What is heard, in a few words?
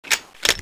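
quad barrel clip out, weapons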